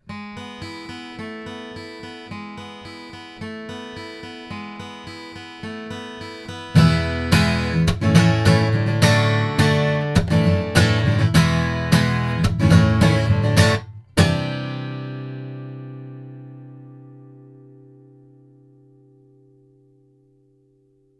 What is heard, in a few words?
Oktava ml-53 guitar